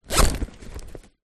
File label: bag camera bag zipper; foley